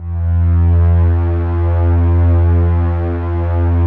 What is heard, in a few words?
ATMO PADS; Best Service; XX Large